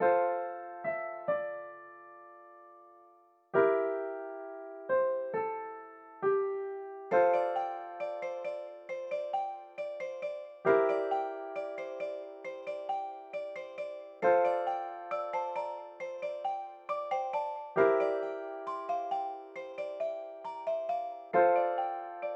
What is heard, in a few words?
yummy piano; inbento